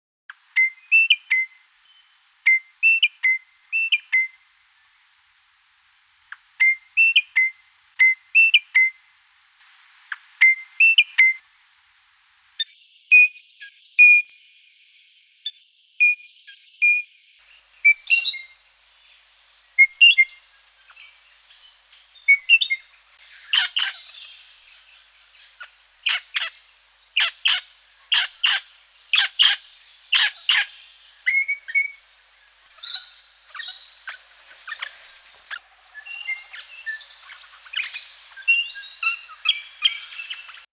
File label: crimson Rosella